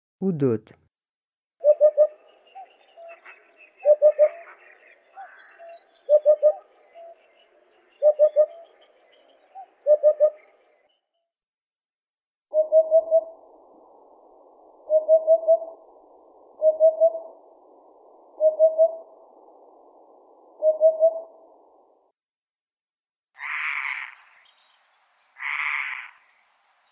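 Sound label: hoopoe voice; udod golos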